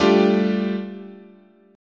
G 7th Suspended 2nd, guitar chord

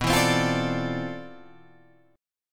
C Major 13th B

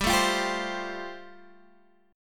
A-Minor 7th Sharp 5th G

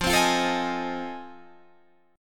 F 7th Sharp 9th, Guitar, Standard; F7#9 strummed; 13,15,15,14,16,16

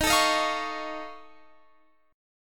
F Augmented 7th Eb, chord

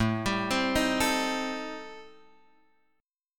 A-Minor 7th Flat 5th, Guitar Open F, chord; Am7b5; arpeggiated

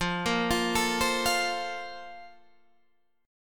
A sharp Major 7th Suspended 2nd, chord

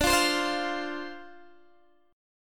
D-Minor 7th D Guitar DADGAD, chord

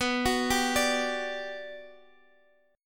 G Major 7th, B

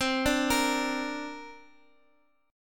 C 7th Suspended 2nd, Guitar, Open D

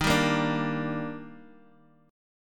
D sharp Major 7th, Guitar Open D

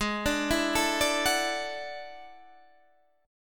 E 11th Ab

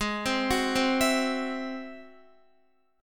Ab Augmented 7th Ab down Guitar; arpeggiated; Ab+7; JGuitar

